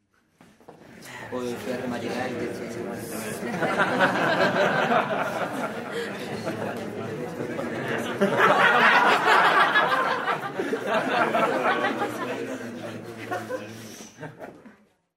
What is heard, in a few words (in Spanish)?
risas bar